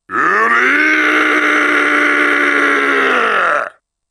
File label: wryyy